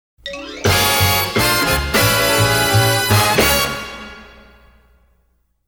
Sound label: Brass Theme, instrumental; Pre-record (instrumental); Pre-Records; PAMS; KLIF